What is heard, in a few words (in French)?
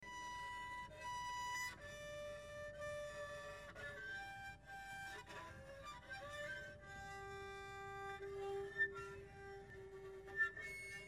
archet harmo, harpe; touchers de la harpe